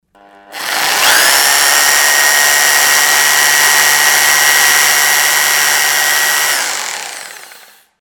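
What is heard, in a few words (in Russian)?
звук перфоратора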